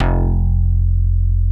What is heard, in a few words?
MIDI bass F1; Greytsounds VOL-1